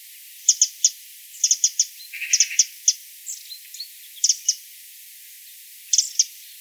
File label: call, wren, Background: Magpie, Great Tit.; This recording was made with an unattended automatic apparatus.; troglodytes troglodytes